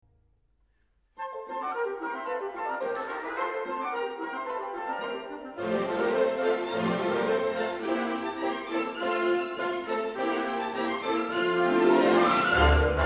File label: Hungarian Scherzo